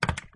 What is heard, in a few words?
knock